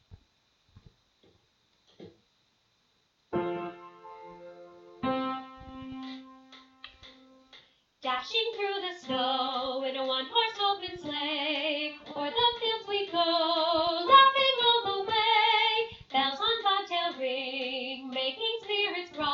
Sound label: Bell Medley; yuletide 2017